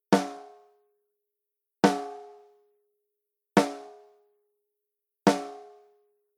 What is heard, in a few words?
RND 511 red silk, preamps snare drum